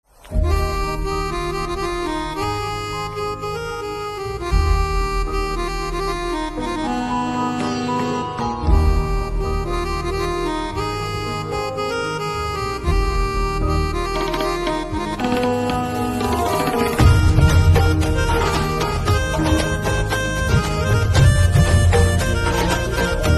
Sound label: Pianica Instrumental Ringtone